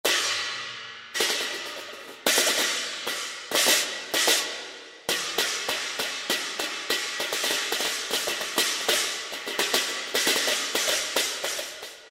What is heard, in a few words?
Chinese Cymbal Phrases (Large); CYMBALS; Spectrasonics Heart Of Asia